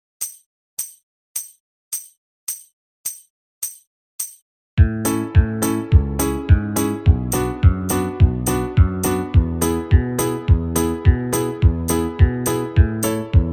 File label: key of a normal, backing track; banjo